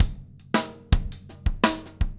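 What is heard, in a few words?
triplets, Drumscores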